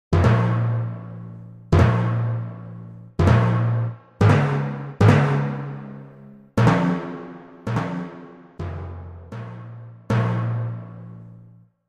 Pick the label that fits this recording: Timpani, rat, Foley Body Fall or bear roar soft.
Timpani